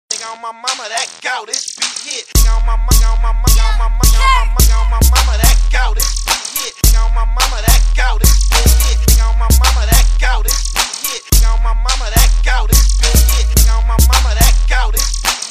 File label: This Beat Hitttt